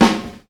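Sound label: classic warm snare sample a key, tuned to the A note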